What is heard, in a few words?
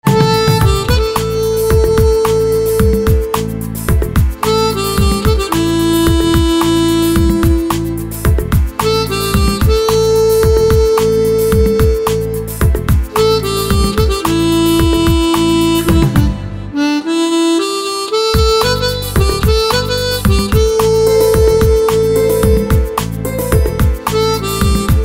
Harmonica